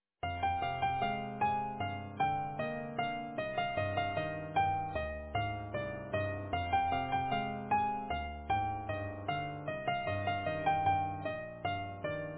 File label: Easy Piano